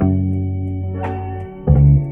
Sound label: bass, Loops